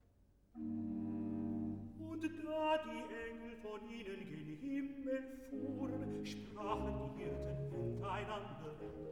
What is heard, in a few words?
Recitative; Und da die Engel von ihnen gen Himmel fuhren; BACH; Christmas Oratorio